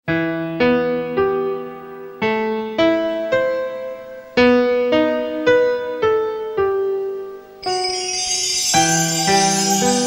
ringtone

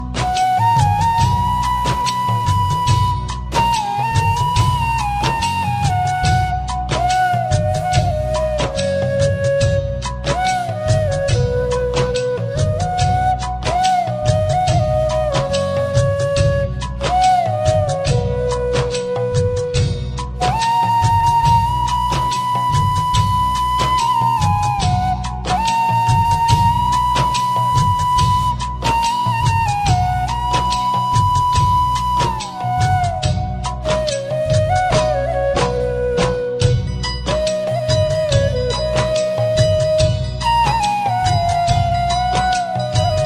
Old Song Flute

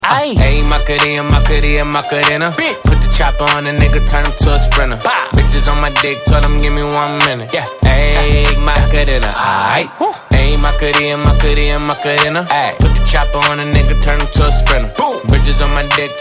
rap

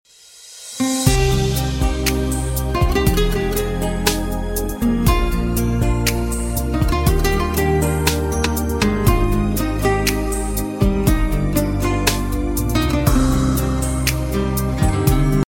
smooth guitar

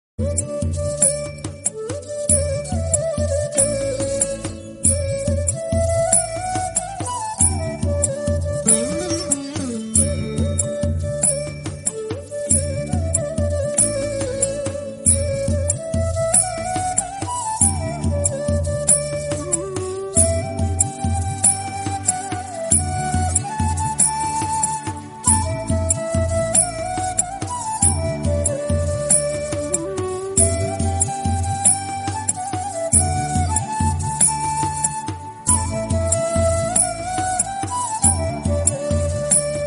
malayalam melody ringtone

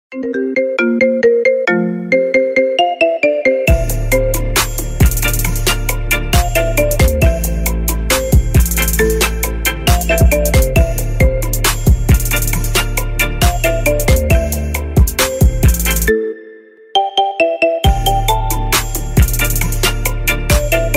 iphone remix

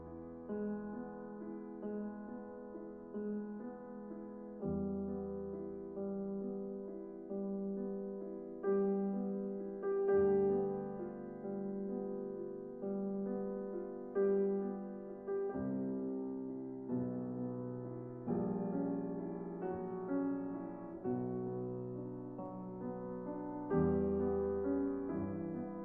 piano sonata

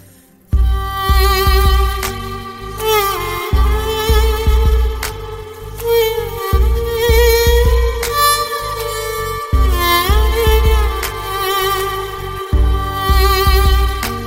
sad violin; sad love ringtones

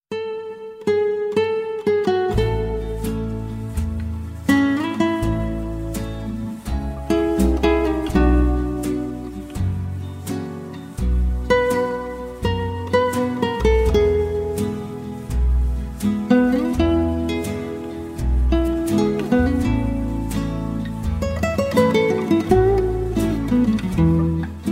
beautiful solo guita

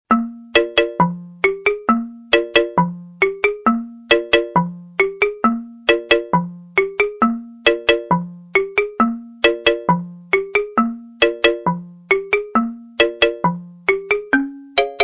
xylophone ringtone

